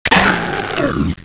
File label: zombie attack